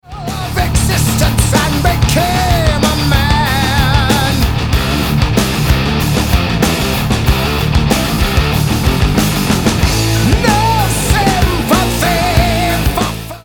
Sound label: Breakdown